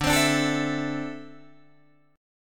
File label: D 7th Suspended 2nd down Guitar Open D